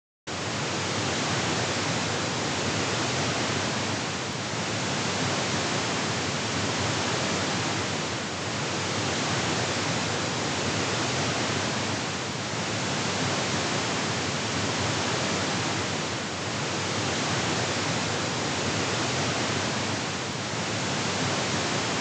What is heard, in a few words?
Continuous narrowband Surround-Sound frontal pa; Surround Sound Setup and Imaging; Surround Spectacular